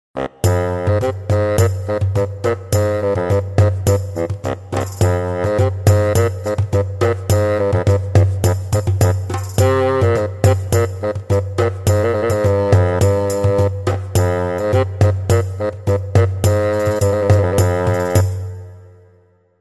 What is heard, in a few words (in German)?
Fagott